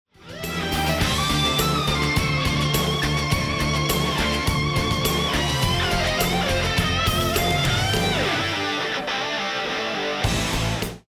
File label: start action; music kits custom